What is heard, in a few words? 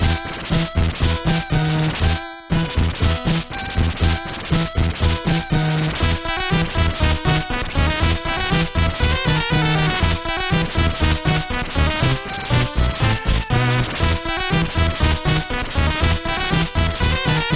music tournament loop; Skellboy